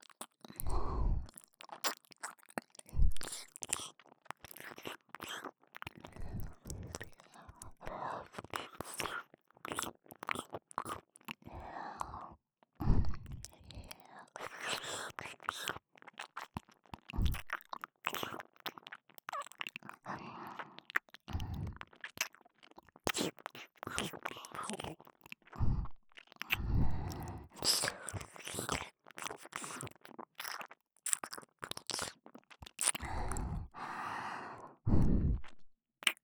耳舐めループ

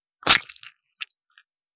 射精音

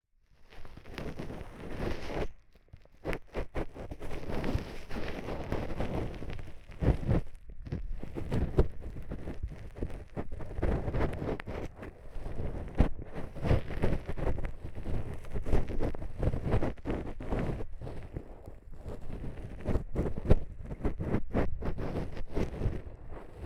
綿棒で耳かき(両耳同時); スライム娘ちゃんの出張リラクゼーションサロン！?; 声なし

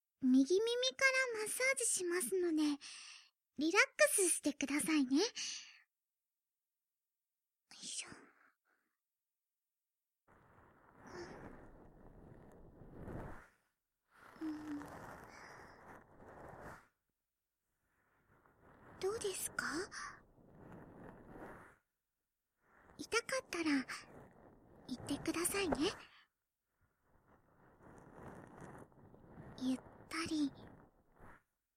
右耳マッサージ; 見習い天使が頑張るあなたに癒しをお届け; かしまし☆とらいふる

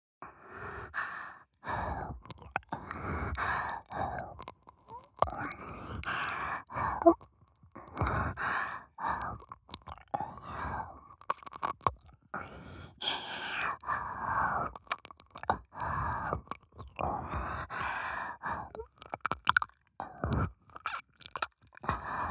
耳なめ; 方言メスガキ姉妹なんかに絶対に負けない！！; ドSロリ

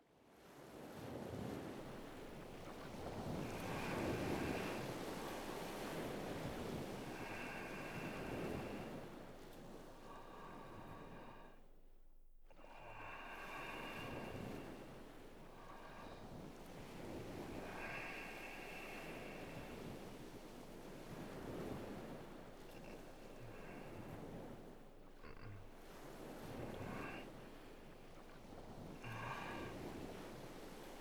オイルでぬるぬる

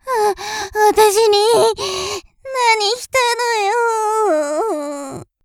薬盛られて失神

